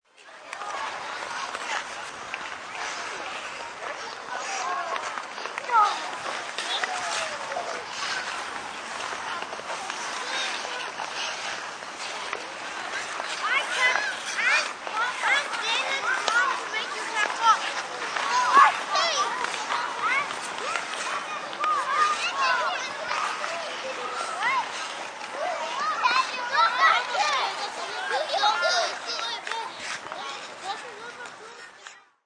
Ambience Skating, Skating, 20-25 children, can hear some clear voices as they skate by; human voice, kids, chatter, background voices, yelling, arena